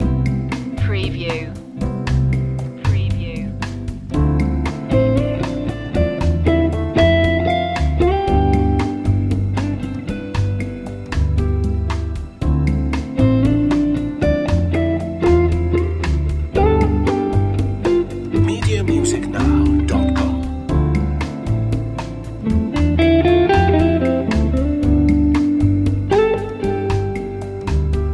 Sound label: moody bossa